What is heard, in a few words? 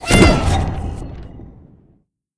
fire; Smite; Zhong Kui